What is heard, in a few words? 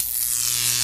voltage; garrysmod